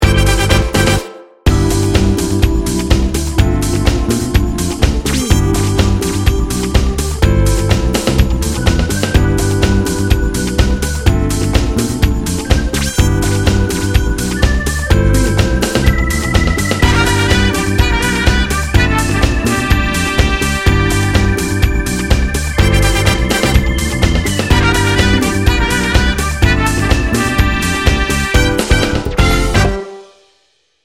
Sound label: celebration funk house